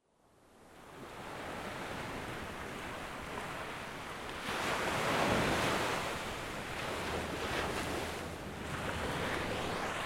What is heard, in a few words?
SEA WAVES; NATURAL; RK NATURAL SOUND EFFECTS